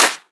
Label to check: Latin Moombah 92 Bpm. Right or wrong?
Wrong